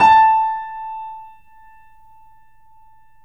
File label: BPM L4 A, The Piano; InVision Interactive